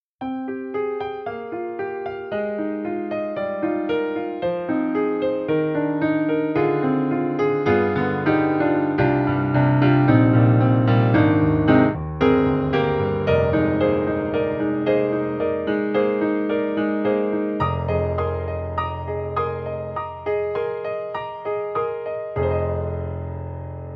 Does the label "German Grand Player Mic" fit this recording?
Yes